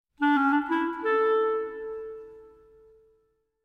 mnemonic clarinet; shell

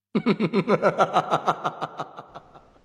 laughing; shah rukh khan

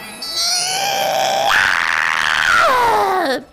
war cry

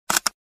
camera shutter sound effect

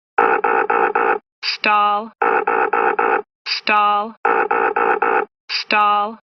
boeing 717 stall

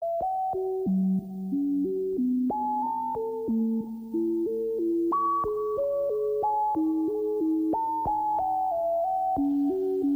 cast fireball

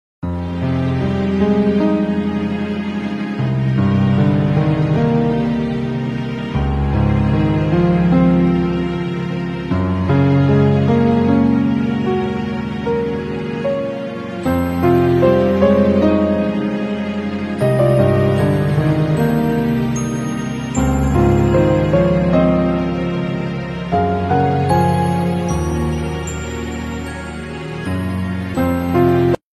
save room; resident evil code veronica

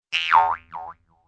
vibrating boing

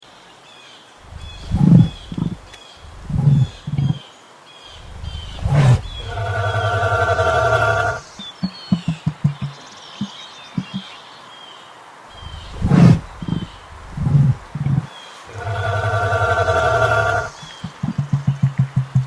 dilophosaur

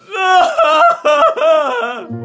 crying

